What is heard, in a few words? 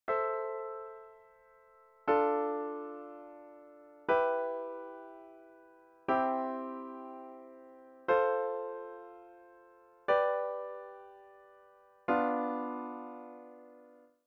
basic chords major; shosys academy; conventions of chord labeling